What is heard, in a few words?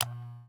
Electronic, Latch, click, heavy, clippers, on; Eiravaein Works; Vaeyan IV